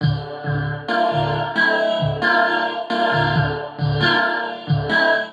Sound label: Shifted Rhythms D Minor 135 bpm, Voice Box; MIDI Clips; Ableton; Factory Packs; Harmonic Racks